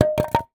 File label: Drop Soft - Single Plank, Drop, Wood; Double Trouble Audio